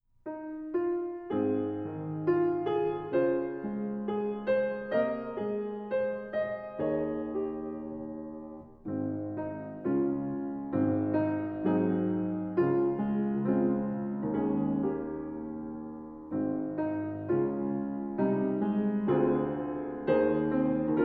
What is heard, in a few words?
Eb accompaniment